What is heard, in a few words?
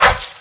swipe; Fruit Slicer